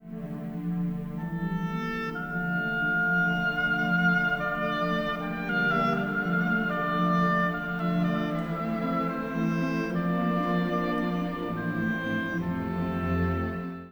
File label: love soft; Star Wars